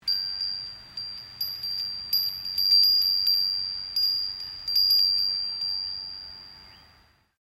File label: Wind Chime Japanese Bell Type; Various windchimes; Musical